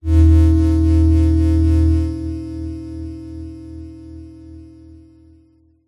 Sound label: science fiction force field short enery burst